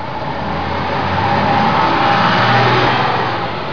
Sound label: bus; SIMUTRANS